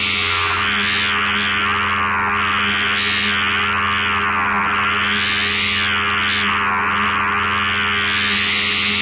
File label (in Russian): televizor tainstvennie shumi i pomehi